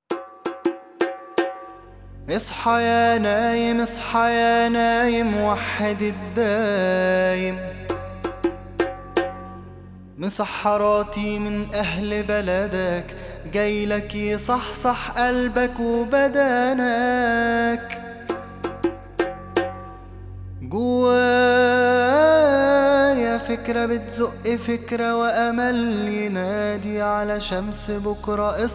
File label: Tabla; Daet